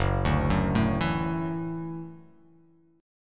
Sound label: Gb Minor 7th Sharp 5th Gb; arpeggiated; JGuitar